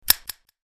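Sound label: close, SoundEffect; Huawei U3315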